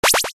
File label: Box Sound; sushi cat